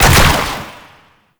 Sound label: Ranged Weapon Fire; Warframe; Necramech; Weapons